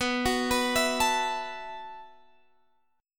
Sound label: E Suspended 2nd Suspended 4th B